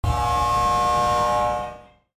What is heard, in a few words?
horn; squid game